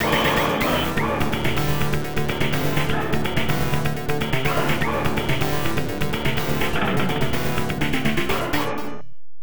Round Clear; Star Starter; Round Clear 1

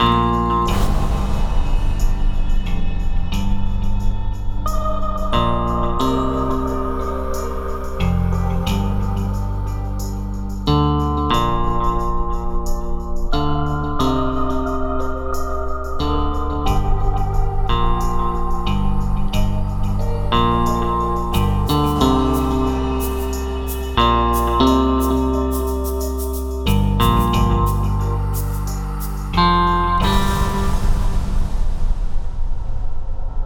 Loading Screen; Buried; PS3; Xbox 360

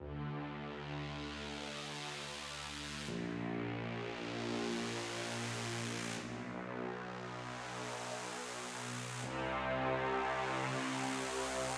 End Build KEYS; transistor

